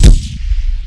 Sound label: M41A double shoot